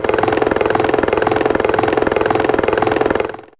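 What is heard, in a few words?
Helicopter, Fairlight CMI IIe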